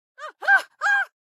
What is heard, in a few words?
town human road; Blind Drive